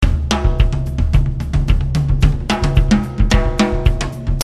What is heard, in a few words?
Jungle